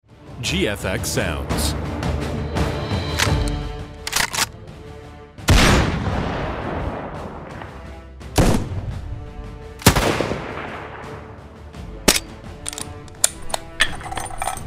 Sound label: Sniper strike sfx pack